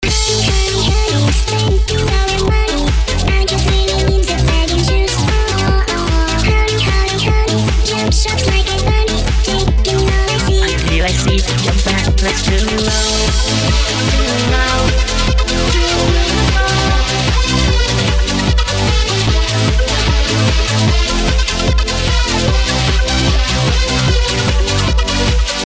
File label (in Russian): веселый bubblegum-pop в стиле нулевых